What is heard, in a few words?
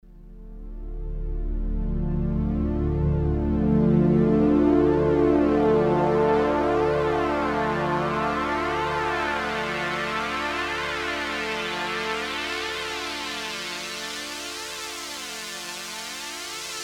Wasp BP